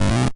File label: stego Moan; Dino Run